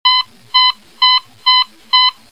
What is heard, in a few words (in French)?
Bip bip